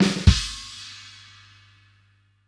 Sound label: Fills 120; Zero-G; Monster Beats